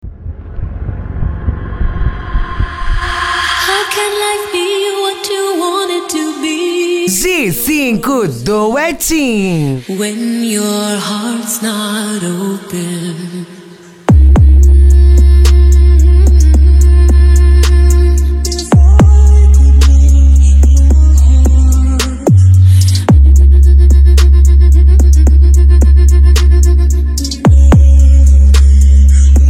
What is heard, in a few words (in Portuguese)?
mega funk e funk mega bass